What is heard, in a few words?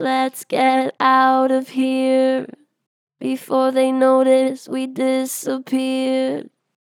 Str Flat Lets get outta here, Vocals, Pitch Flat Strong